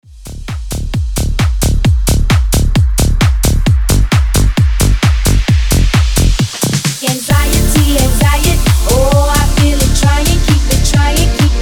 anxiety breaker 132 bpm; bootleg

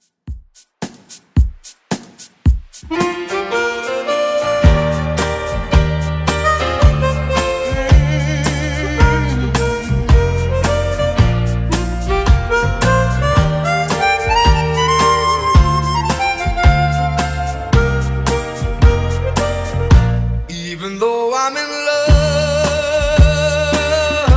80s Redrum 110bpm

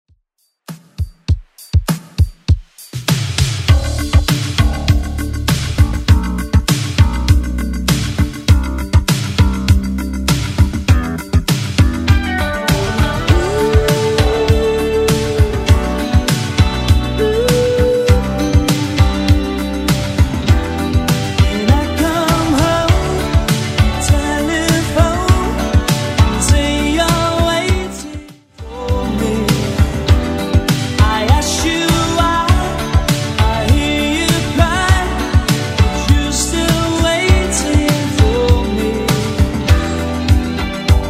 80s Redrum 100bpm

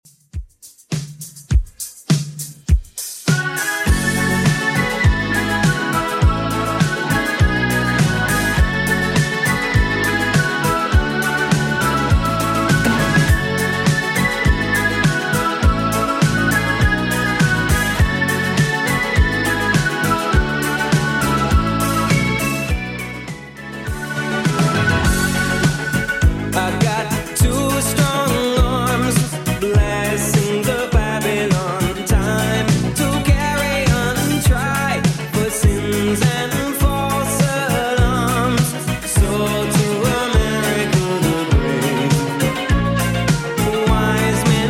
80s Redrum 102bpm

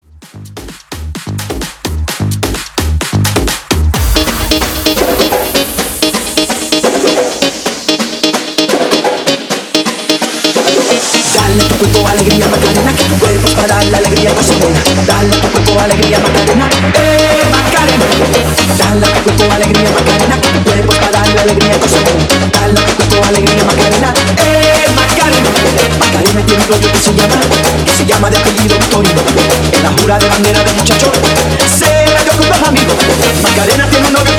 Tribal Dance Extended Edit 129 BPM

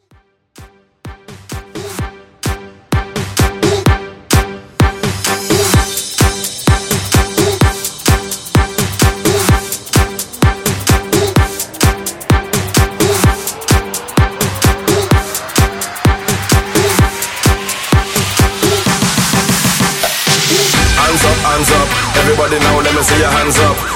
Christmas Bounce Xmas Big Horn Banger

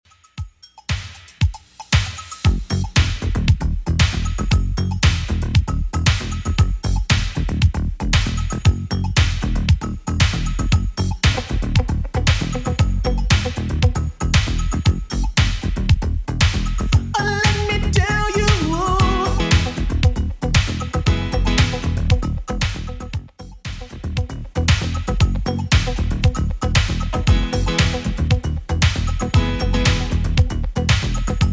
80s Redrum 116 bpm CLEAN

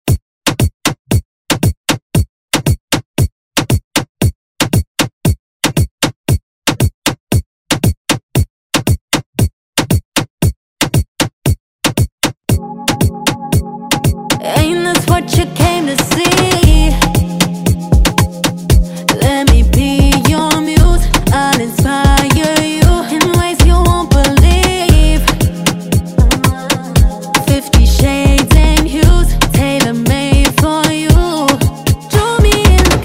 Reggaeton Redrum

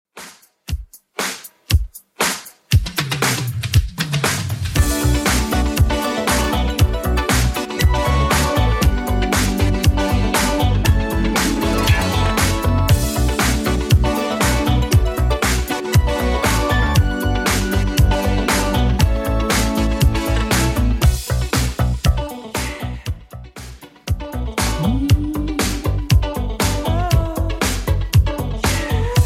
80s Redrum 118bpm

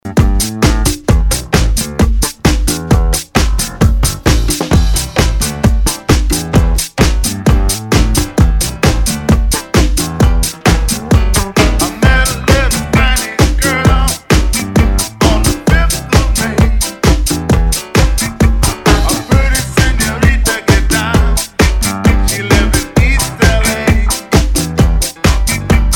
Latin Club Party ReDrum

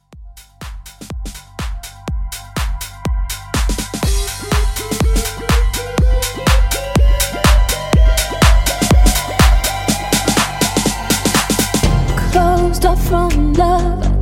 123 CLEAN

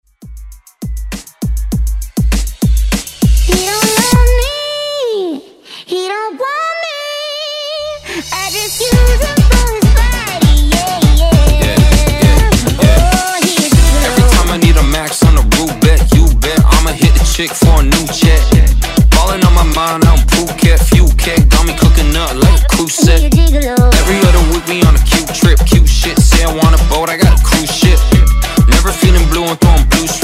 Top40 Redrum

